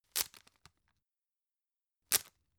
tissue open hard

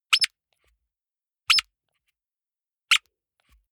squeaker squeeze hard

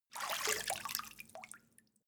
step water walk out

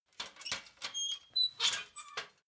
ladder metal handling fold

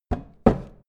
wood handle

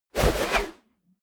whoosh air

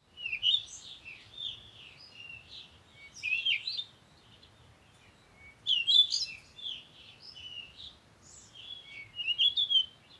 african bush morning birds

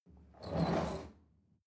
chair wheel roll on floor soft squeak

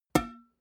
glass door small hit damped

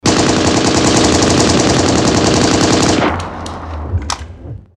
machinegun

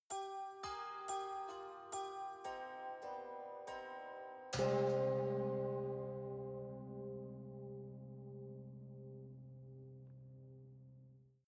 haunted strings quiet sequence

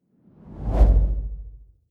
whoosh impact soft wobble bass

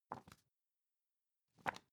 magazine page handle

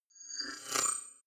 movement creepy robot

